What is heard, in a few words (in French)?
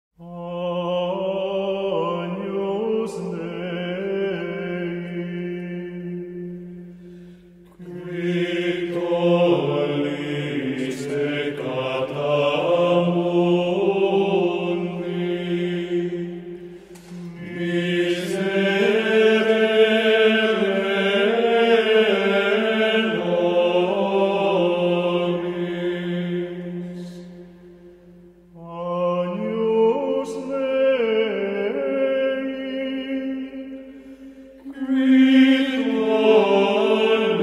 Chant Grégorien Agnus Dei, musique sacree